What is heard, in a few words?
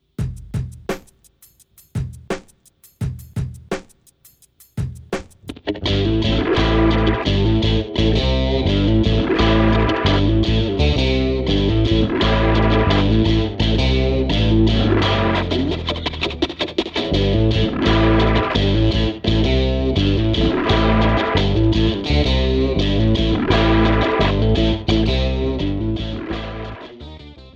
Crossover Groove, Grooves; Bum Bum Tschack Grooves Fills